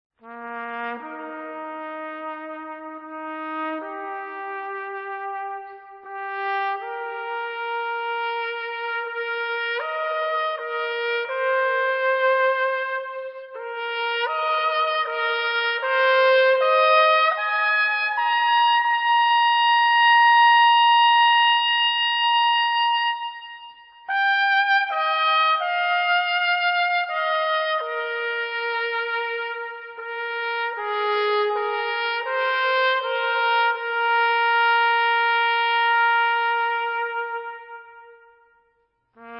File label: Trumpet Solo